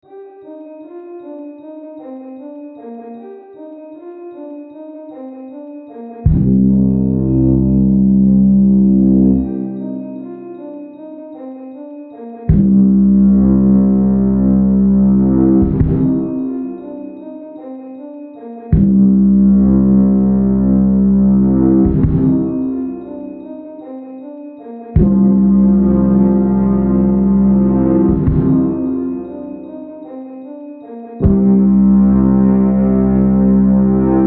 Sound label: Trailer Music; movie trailer music